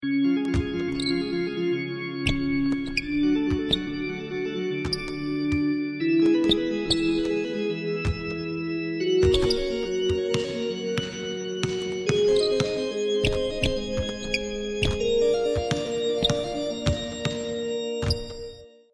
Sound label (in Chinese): 风琴上行; 战中; 战斗音效; nba